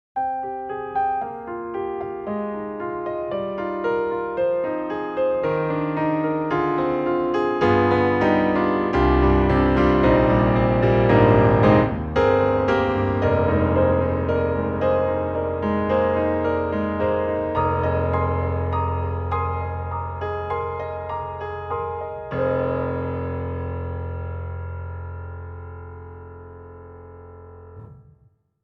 Rosewood Grand Lullaby; Orange Tree Samples; Evolution Rosewood Grand

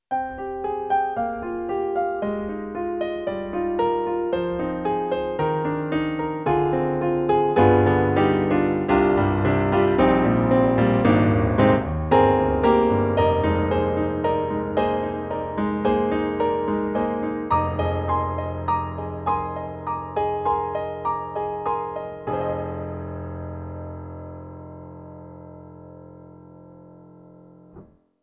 KORG SV-1 MonoGrand

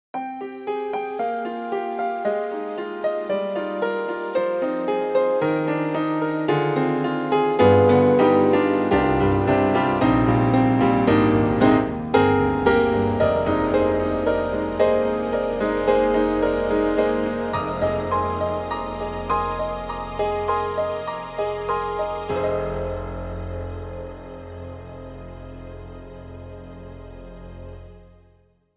Japanese Grand + Strings; KORG KRONOS 2

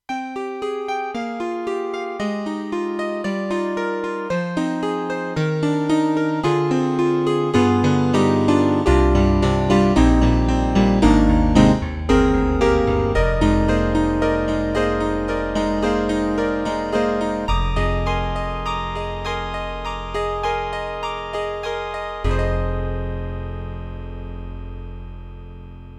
El. Grand; YAMAHA TG100 GM

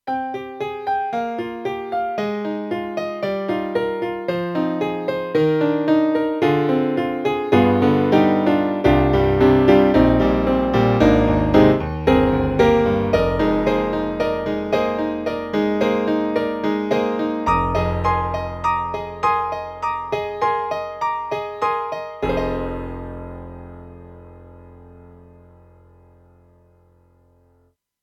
CASIO GZ-70SP PIANO

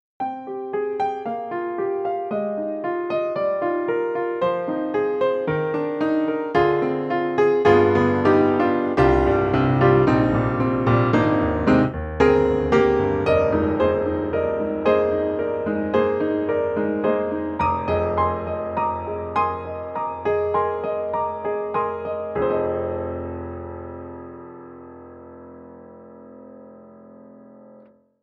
KORG KRONOS 2 Una Corda Piano; Berlin

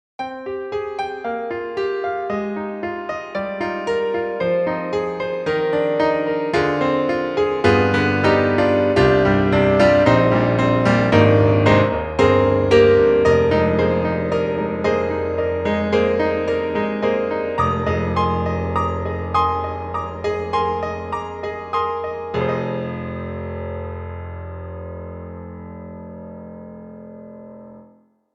imperial grand, classical grand; IK Multimedia; SampleTank3

